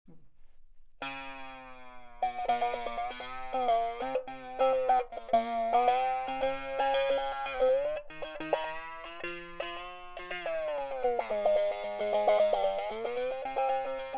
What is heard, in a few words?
Tremolon